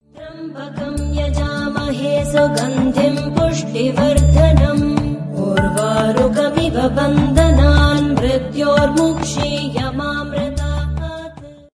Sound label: Om Mantra